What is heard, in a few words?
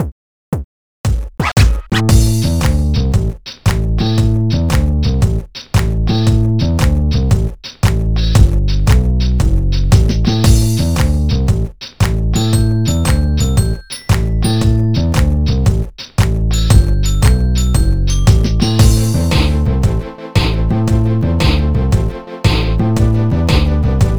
Music VICTROLA BPM115; Super Scribblenauts 2010 NDS